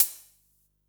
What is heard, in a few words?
Closed Hihats; Vengeance Samples; VEH3 Cymbals